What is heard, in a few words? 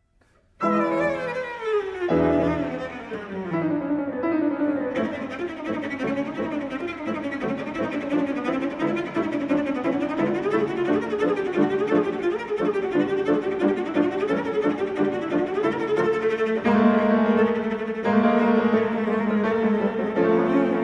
melody